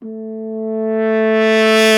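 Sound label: brass, F.Horn Swell; Roland L-CD702; F.Horn FX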